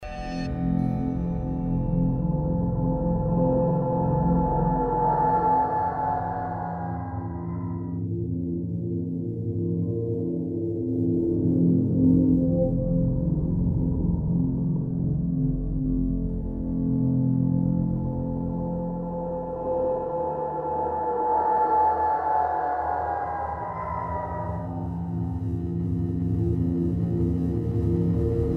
Pulse of Wind; sh origins

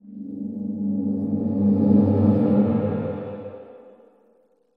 Move, horror and atmosphere fx; Silent Hill Downpour

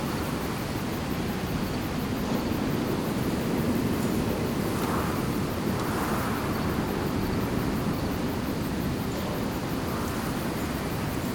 Base Wind Loop; Diner; Silent Hill Downpour

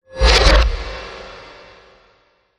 Iron Impact Heart Attack; horror and atmosphere fx; Silent Hill Downpour

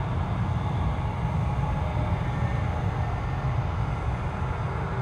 Screamer Attack Ambience Loop; horror and atmosphere fx; Silent Hill Downpour

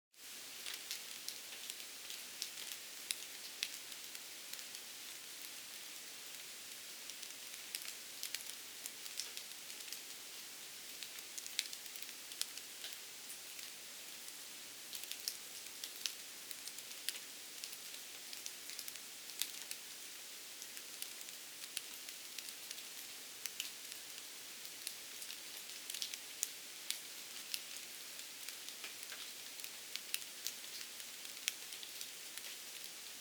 Light Rain Drops; weather fx; Silent Hill Downpour

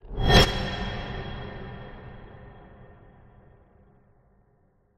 Metal Rev Sting; horror and atmosphere fx; Silent Hill Downpour

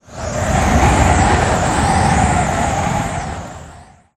Wind Gust Loop; weather fx; Silent Hill Downpour

